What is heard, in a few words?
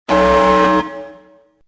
alarm; prototype